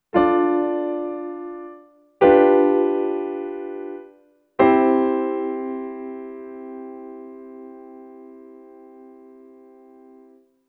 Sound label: imperfect to perfect cadential movement over loop point